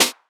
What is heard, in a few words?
Snare, jungle breaks drum sounds